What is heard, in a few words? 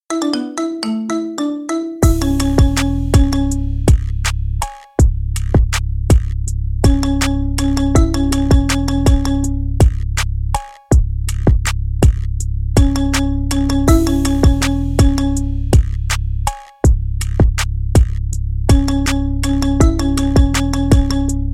marimba remix